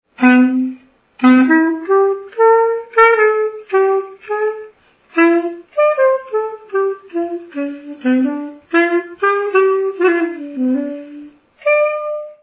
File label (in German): xa vergleich c classic, Xaphoon; Blasinstrumente